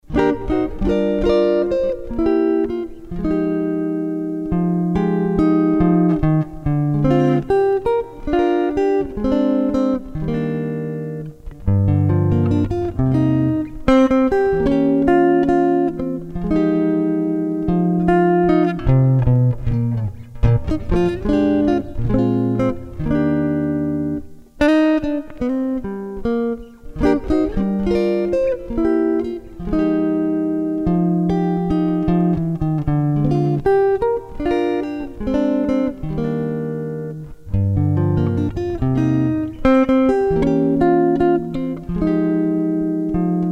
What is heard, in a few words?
Jazz guit